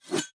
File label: Swing Spear Short; Swing Spear Short Second